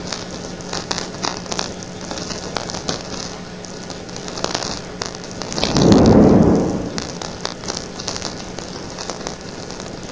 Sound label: car pass